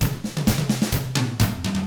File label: 127 Drums